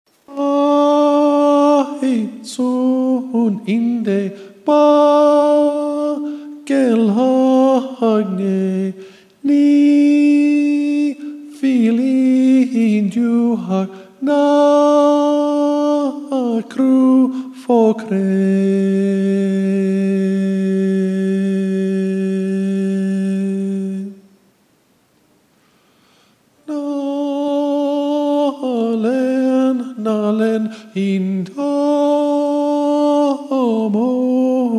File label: Tenebrae IV Tenor solo; Tenebræ IV—Men; Lent/Holy Week 2013 Rehearsal recordings